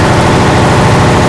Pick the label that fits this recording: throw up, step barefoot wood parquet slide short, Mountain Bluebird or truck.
truck